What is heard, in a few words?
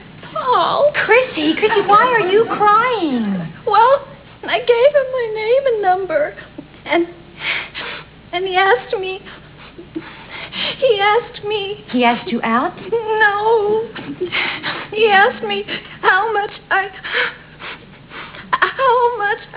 Funky Fox Low